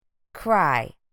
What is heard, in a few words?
cry